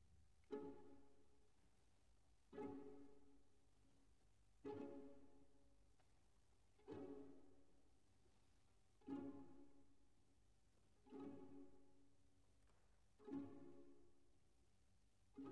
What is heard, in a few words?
Piano Concerto, Andantino Semplice - Prestissimo